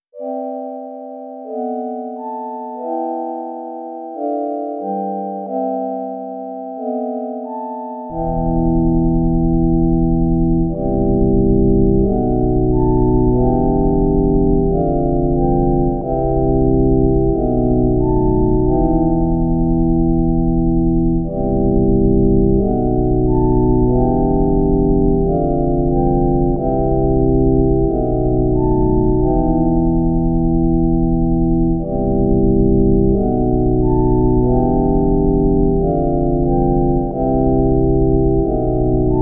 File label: no drum